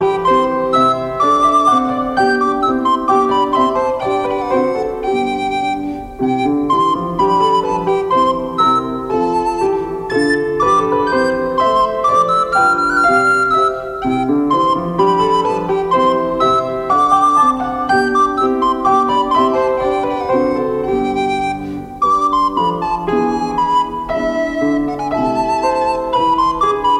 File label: Gavotte